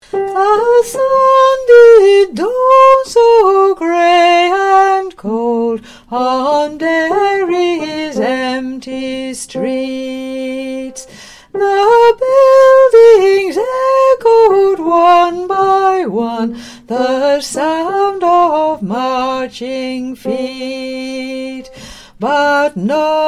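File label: Soprano; Derry Streets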